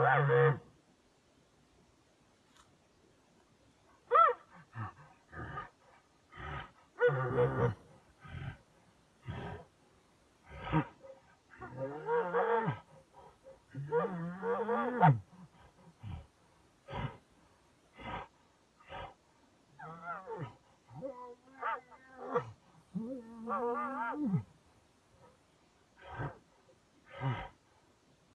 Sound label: Calls Huff Moans Groans Pants